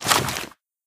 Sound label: deploy, sound; svencoop; hl2